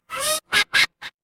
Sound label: cartoon squeak